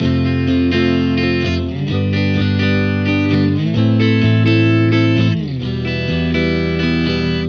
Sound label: Electric Pop Guitar 128BPM Amin; Vengeance - Essential Clubsounds Vol.4